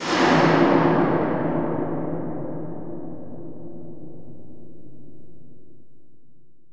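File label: STEEL GOTHI, percussion metallic; Rarefaction; Poke in The Ear With A Sharp Stick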